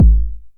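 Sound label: 909 BD tape, kick drum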